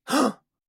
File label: man gasp